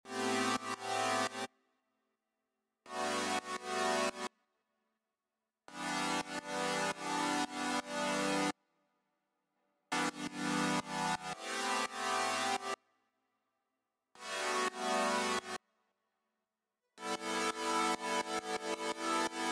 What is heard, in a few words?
chord